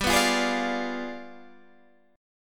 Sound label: G 7th Flat 9th, Guitar, Standard; strummed; JGuitar